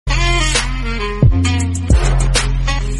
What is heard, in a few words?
Trap Notification; The Pink Panther